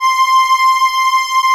ATMO PADS; Best Service; XX Large

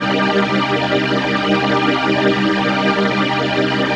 PHASE PAD, ATMO PADS; Best Service; XX Large

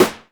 PERC; ZERO-G; Datafile

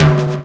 TOM TOM; E-Lab; X Static Goldmine 2; akai

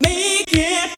VOX; ZERO-G; Datafile

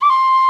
FLUTE; ZERO-G; Datafile

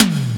TOM

